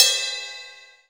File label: RIDE, MONO RIDE CYMBAL; AIRRIDEOFF2